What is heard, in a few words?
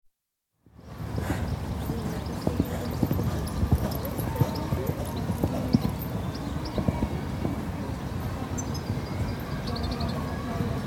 Spain: One horse exercised in outdoor riding school, with lots of birdsong, subdued chatter, children playing in distance & distant traffic; Environmental Sound Effects; BBC